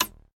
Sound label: TV Off; MiSide